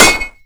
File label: hit, crossbow